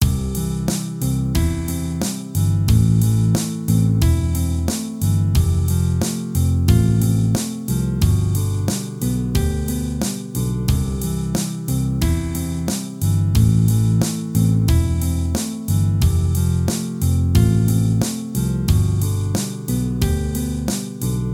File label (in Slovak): pomaly rock gitara nylon rozklady